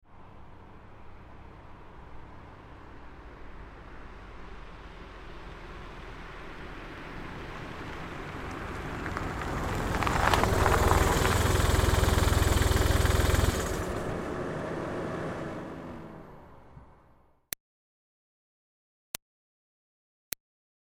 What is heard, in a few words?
Car Smart Car Up Stop Dirt; 2005 Smartcar Fortwo; Cars (Sound Effects:Vehicles); Big Room Sound